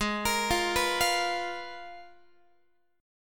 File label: G sharp Minor 6th Add 9th, Guitar; G#m6add9 arpeggiated; x,16,13,15,15,16